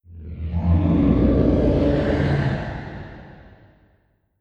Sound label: distance dragon attack mouth; Stellaris; guardians